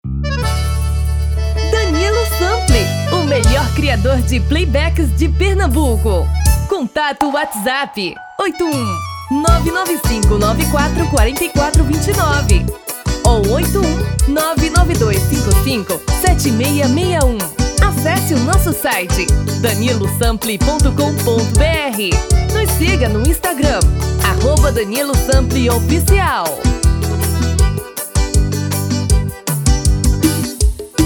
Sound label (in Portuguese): Vanerao tom masculino